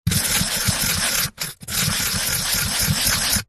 office marker pen on cardboard fast scribbling circles